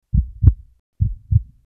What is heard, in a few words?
heartbeat; cstrike; vip